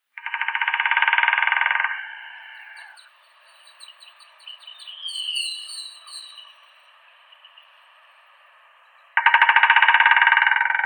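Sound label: white-backed woodpecker, Dendrocopos leucotos; dyatel belospinnii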